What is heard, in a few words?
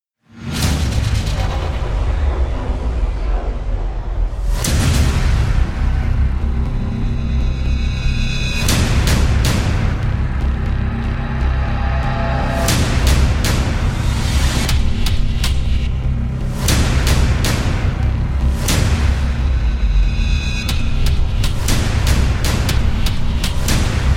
trailer backends kill switch